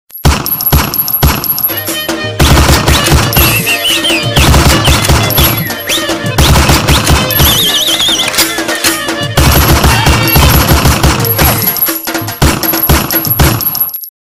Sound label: Ringtone